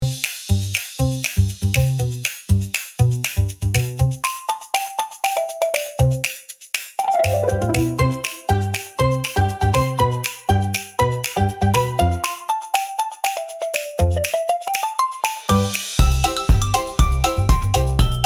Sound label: Playful bouncy whistle and wiggle